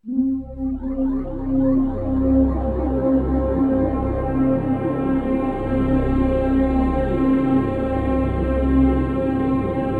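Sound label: synth C1, Deepwater Sarrar, YAMAHA TX16W; Best Service ProSamples vol.55 - Retro Sampler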